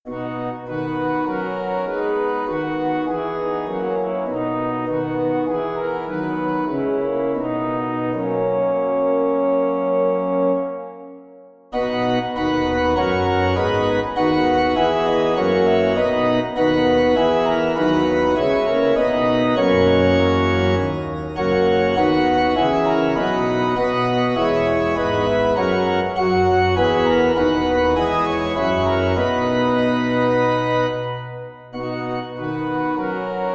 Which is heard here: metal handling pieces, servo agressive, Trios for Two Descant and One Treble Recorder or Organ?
Organ